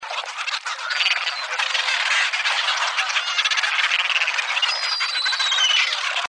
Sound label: diomedea antipodensis; albatros brązowopierśny